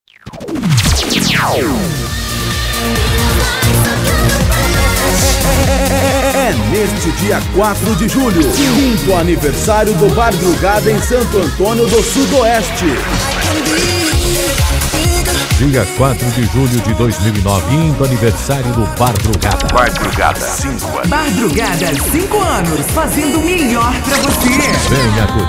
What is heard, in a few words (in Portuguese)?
sertanejo remix e electro dance